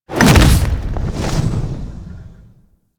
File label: Jump Launch; Enemies; Infested; Warframe; Femur